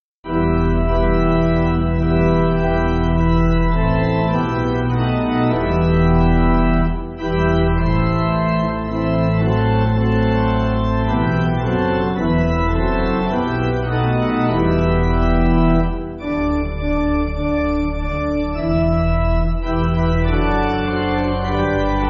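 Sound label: Pipe, Pipe Organ, Backing Files for Churches; Come Let Us Ascend; Russian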